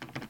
Door locked

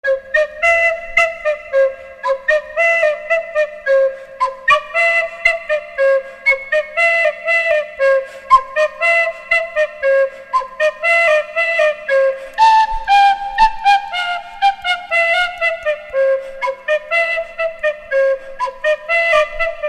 Shepherd S Flute

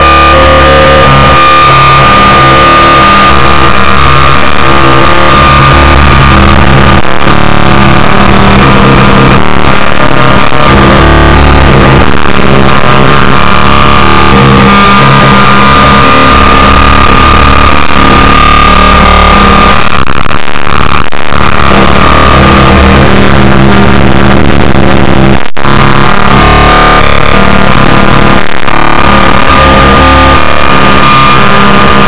Interference

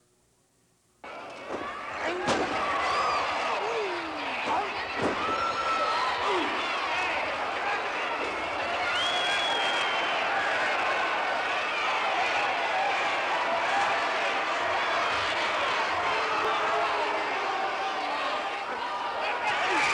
Sporting Event With Steady Cheers

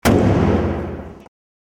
Battering Ram Banging On A Door

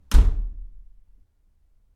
Heavy Door Closed

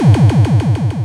Spring

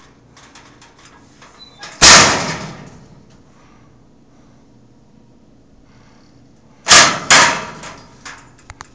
Locker Slam

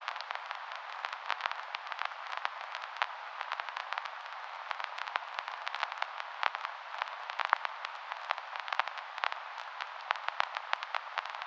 Vinyl Noise Old

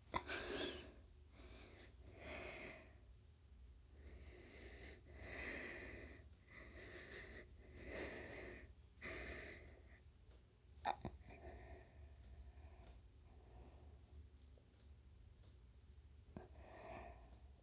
Heavy Breathing And Texting

